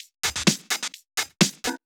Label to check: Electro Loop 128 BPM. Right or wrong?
Right